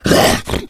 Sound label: hunter stunned; pain